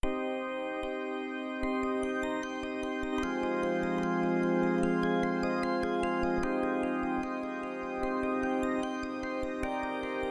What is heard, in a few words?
Type Beat Funk X Brasil, Lo-fi